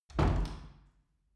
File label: wood door old reverberant